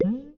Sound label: Slime Vox Big; Going Under